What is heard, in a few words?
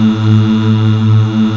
MMM A2, POP CH